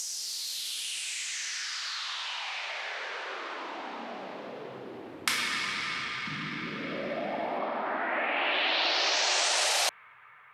Fx, インパクト ノイズ フォール; アタックがはっきりした音ですね; スタワンArtistの付属音源

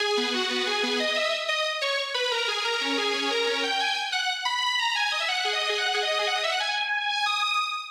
Intro Lead, ポリフォニック＆グライド無し; リードなのに複数音が同時に鳴ってしまうので、パッとしません。

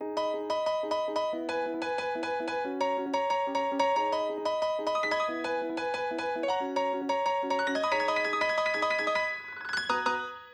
Chord Pad Piano, 結構激し目コードを鳴らしたりアルペジオにしたりしています。; ピアノパートです！; KontaktNoire

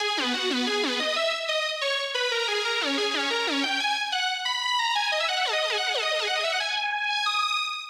Intro Lead, ※分かりやすいように、レイヤーした2本のうちVitalのみを鳴らしています。; ポルタメントでルート音へ上げ下げ

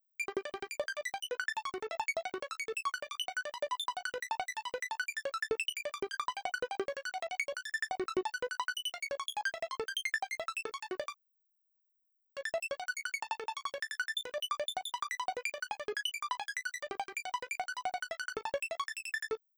Arp, 16分音符で動き回っていただいています。; Synth1; Arpeggiator

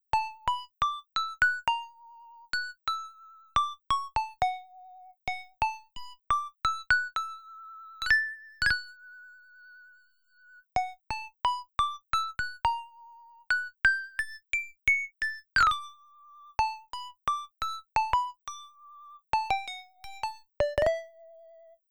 Lead 2, この通り、最早プラックである。ベルかもしれない。; 右上のENV 1が切り立っていますね。; Vital

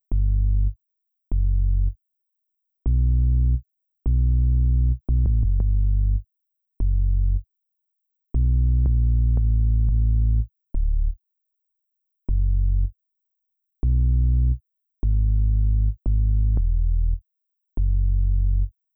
Sub Bass, こんな感じの重低音しか鳴っていない、 / 倍音もほとんどないベースですね。; KawaiiFutureBass; Sin波

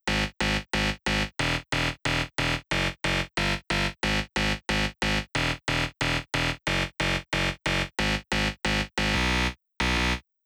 Bass, 倍音がしっかり出ているので「ンビーッ」とか「ンベーッ」って感じがしますね。; メインベース; サブベースが担当しない、150Hzや200Hz辺りより上を鳴らすパートです。; Vital

